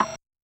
Rhythm SideStick Grinder, Realistic Concertmate-670 drum machine